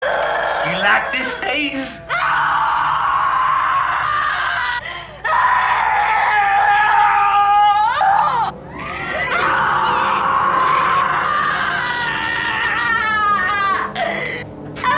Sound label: screaming